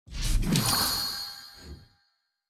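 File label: Sound Monster Gardening; Starbites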